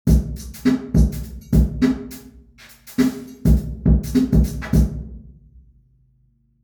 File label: drum, lime kiln